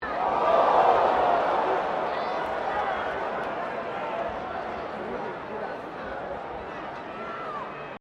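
crowd 15K Rugby World Cup boo, Rugby world cup game; Crowds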